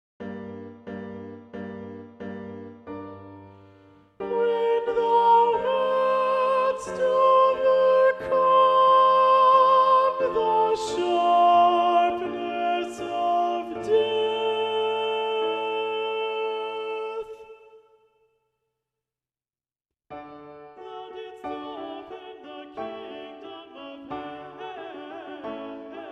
Soprano; When Thou hast and Thou didst open; Handel; Te Deum

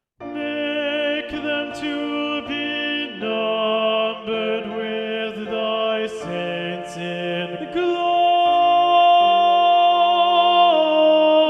Make them to be numbered Tenor; Handel; Te Deum

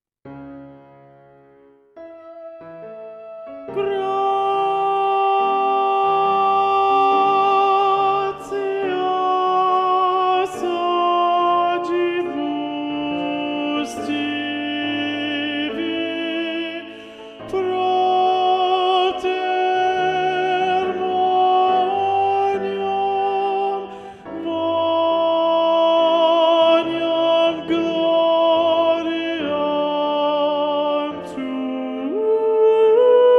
Gloria Gratias contralto; Mozart; Mass Cmin

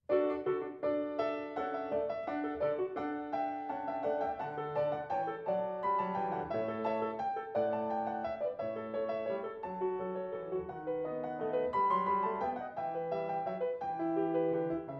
Gloria in excelsis; Bach; Messa in Si; bach messa in si minore

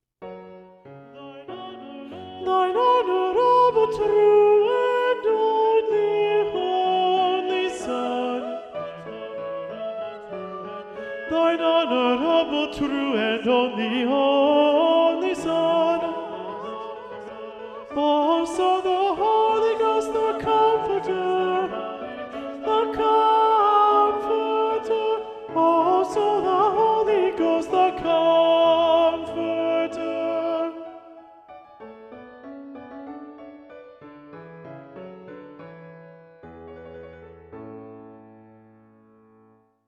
Alto; Handel; Te Deum

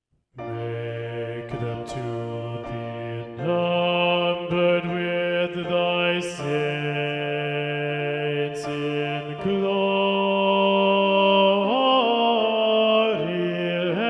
Make them to be numbered Bass; Handel; Te Deum

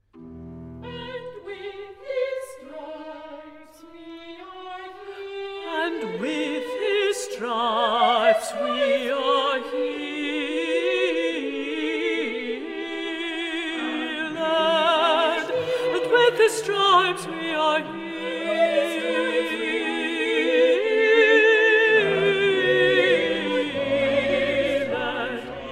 And with his stripes we are healed contralti; Messiah; handel messiah

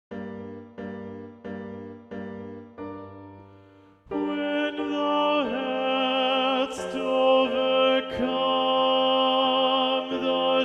Tenor; When Thou hast and Thou didst open; Handel; Te Deum

